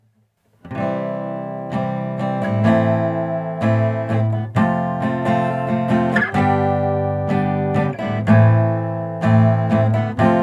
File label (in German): beh mic offen